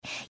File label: breath; pony; Twilight Sparkle